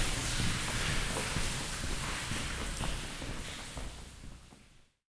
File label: Walking in the tunnel